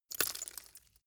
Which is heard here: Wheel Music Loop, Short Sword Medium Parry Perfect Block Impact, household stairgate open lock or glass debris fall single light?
glass debris fall single light